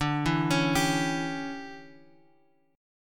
D Major 7th Suspended 2nd Suspended 4th Guitar Open F, chord; arpeggiated; 14,11,13,14,x,x